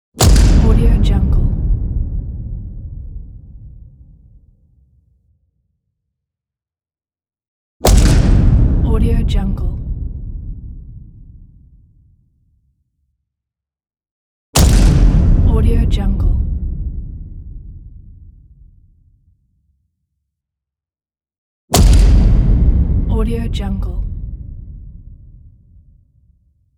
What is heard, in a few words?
cinematic hit explosion